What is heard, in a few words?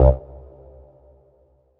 Dance Mega Synth; Best Service; 90 s Sample CDs